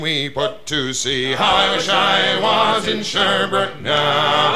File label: music; wu xing; earth